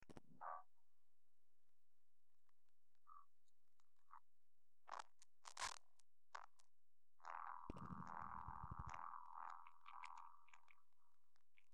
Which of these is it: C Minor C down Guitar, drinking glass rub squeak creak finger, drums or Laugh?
drums